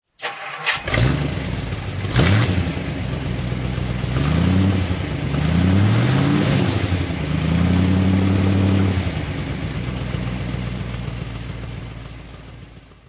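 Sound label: facel fv3 throttle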